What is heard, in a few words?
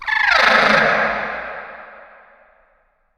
Timid